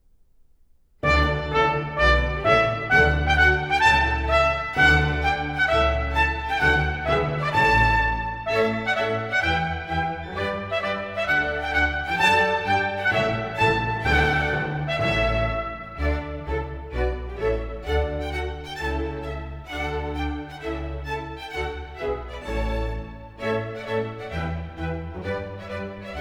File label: Sonata for trumpet, strings and continua in D major, March, Allegro